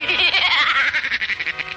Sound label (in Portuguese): risada malefica